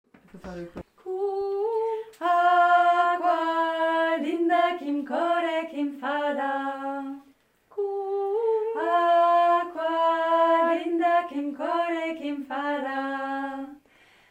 Corse, Voix Medium; chant corse

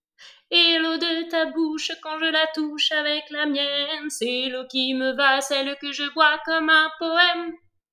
voix 2

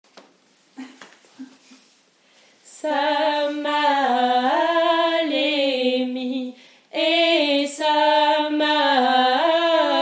voix haute; Stoj stoj pa moru

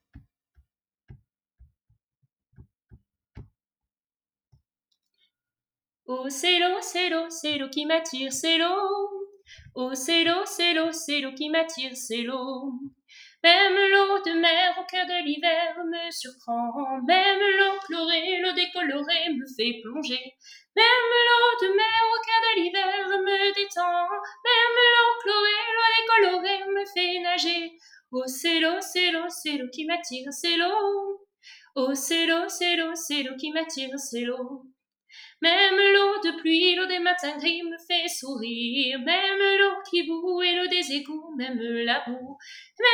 voix 2

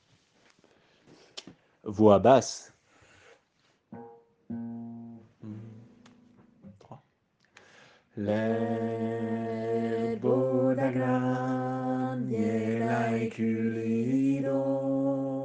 voix basse; Lerba L herbad agram

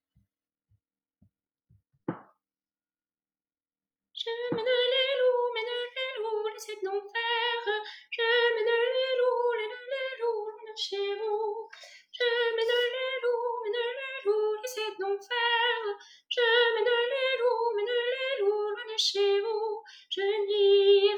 Voix aigue

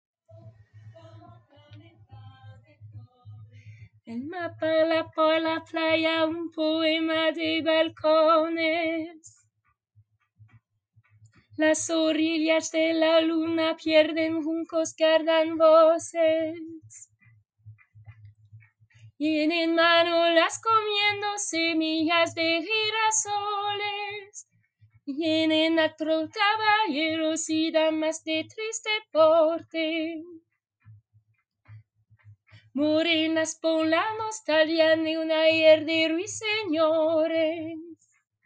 voix aigu

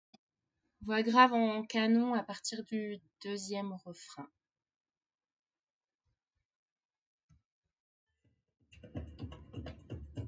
voix grave canon

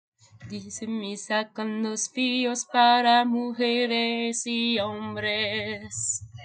Dice misa voix grave

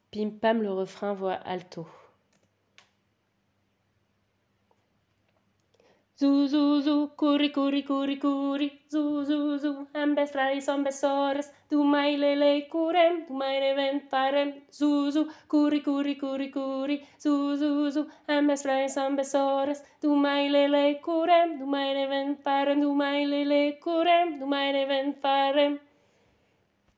refrain voix alto

